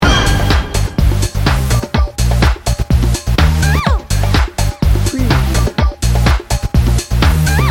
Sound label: bollywood beat blaster loop